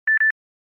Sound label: galaxy s4 beep beep